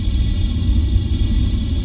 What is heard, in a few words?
rocket; Drunken Robot Pornography; Inago Rage